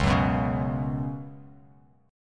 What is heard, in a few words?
C sharp 7th Suspended 4th C sharp; C#7sus4; strummed; JGuitar